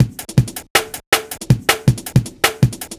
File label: Beat, Drum N Bass